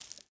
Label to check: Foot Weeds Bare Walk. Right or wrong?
Right